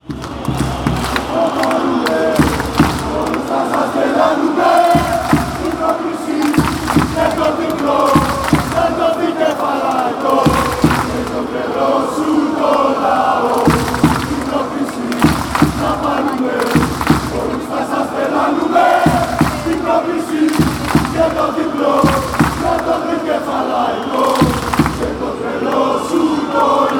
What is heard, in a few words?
chants, greece